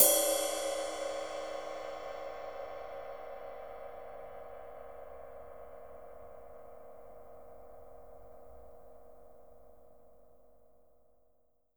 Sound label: ride cymbal mp, drum kit; unpitched percussion